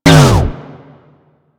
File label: Osprey Shield Funnel Stop